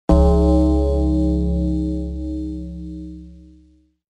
gong; undertaker

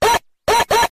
toy phone

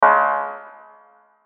phonk cowbell

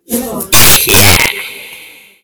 yayeeee

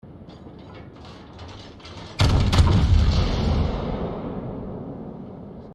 battleship reloading and fire

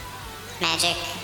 pray; mage

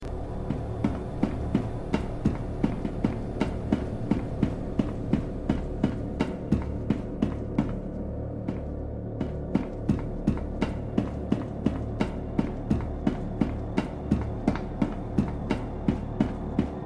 chaos walking; scpsl

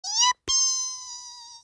cute yipee

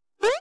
jump sound; rush bros

